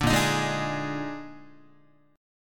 Bb-11th-Bb-down-Guitar; Bb11 strummed; JGuitar

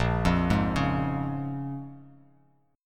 A sharp Minor 7th, Bass Standard; arpeggiated

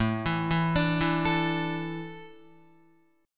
A Suspended 4th down Guitar; Asus4 arpeggiated; JGuitar

